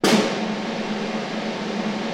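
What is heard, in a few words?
Snare Loop; Digital Sound Factory Virtuoso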